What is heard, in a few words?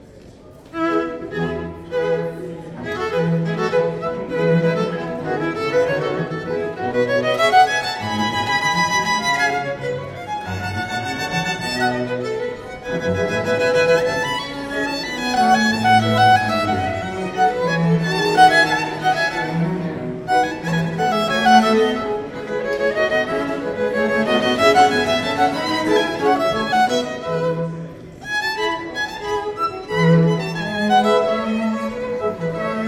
bach violin concerto in E major